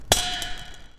impact sound, bicycle; create a impact sound

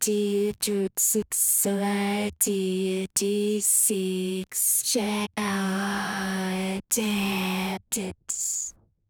dj

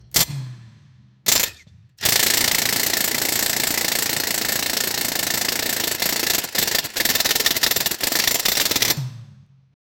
formula 1 pit stop impact; motorsport

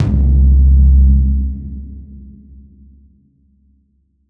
general midi, jazz; flex plugin general midi

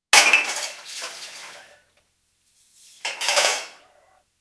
bartender slams a shaker

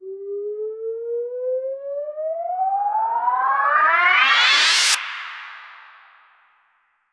a major tonal riser, transition

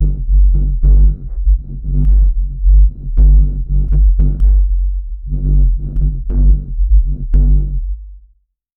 cinematic bass

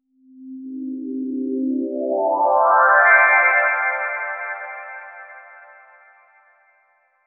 smooth ending sound, descending tone

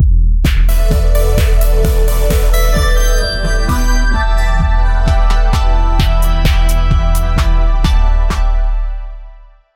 logo intro sound effect w

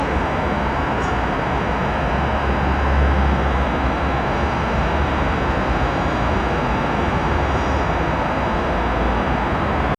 night city noise from the, air conditioning

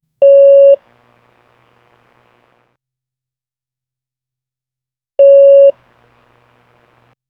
fm radio, communication

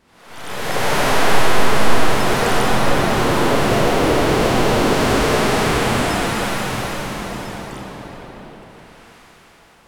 ocean foam and water; whale

